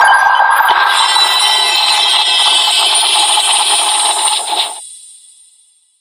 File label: quest status tick advanced complete pda